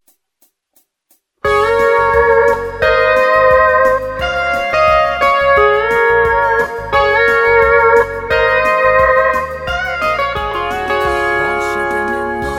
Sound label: steel